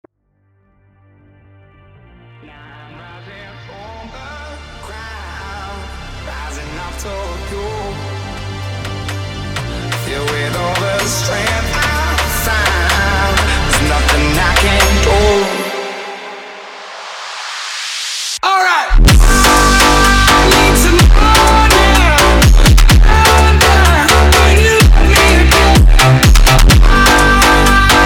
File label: phonk